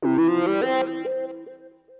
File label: level up